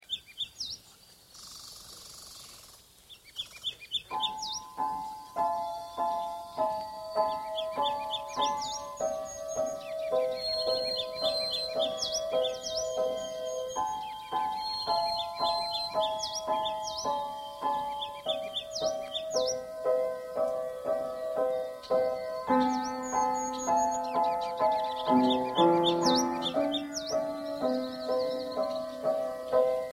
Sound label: pieces for piano